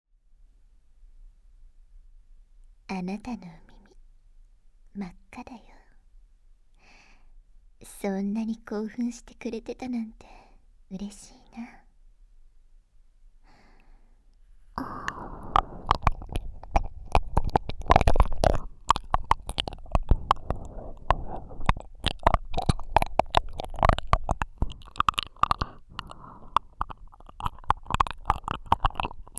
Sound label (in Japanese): 耳舐め; おっぱいとお口で甘やかしの時間(耳舐め・パイズリ・フェラチオ) 効果音なし